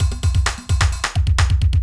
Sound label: electro kit 130